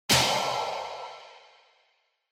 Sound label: Onoma Impact High